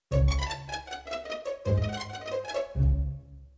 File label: Pizzicato Orchestral Roll